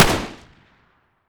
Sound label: ots33 fire, pistol; garrysmod